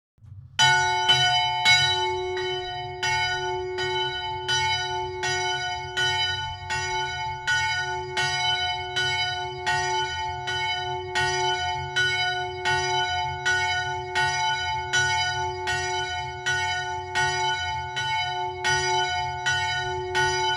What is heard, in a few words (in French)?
Cloche